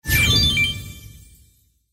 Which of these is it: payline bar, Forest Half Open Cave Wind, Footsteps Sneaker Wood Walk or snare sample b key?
payline bar